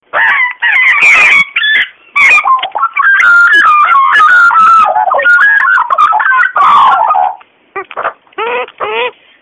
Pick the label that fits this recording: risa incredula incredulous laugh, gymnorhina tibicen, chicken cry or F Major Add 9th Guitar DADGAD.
gymnorhina tibicen